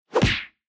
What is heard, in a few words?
Hit